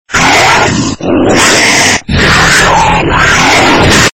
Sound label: crazy monster terror